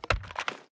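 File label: sniper reload empty rotate; gmod; iw8; xmike109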